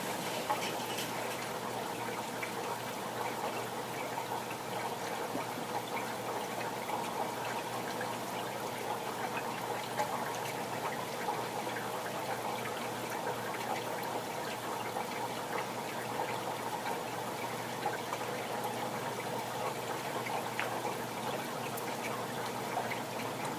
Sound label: Rain from Inside